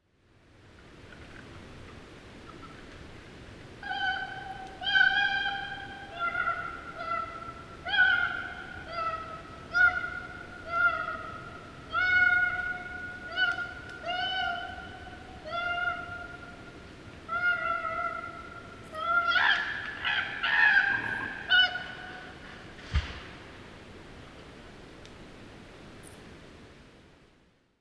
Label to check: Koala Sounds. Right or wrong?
Right